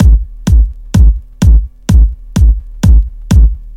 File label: short cold kick loop techno; drum loops